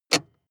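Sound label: sports car interior handbrake pull very short; verkehr-transport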